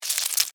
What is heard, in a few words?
Alien Creature, Scifi, Alien, Creature, Movement, Insect, Science, Fiction